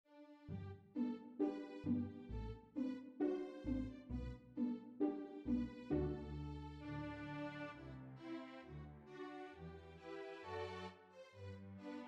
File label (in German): Streicher; ein vogel sass auf einem baum